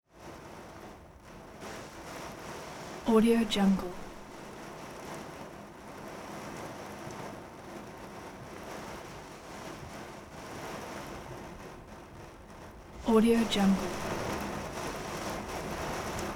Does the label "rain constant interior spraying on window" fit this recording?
Yes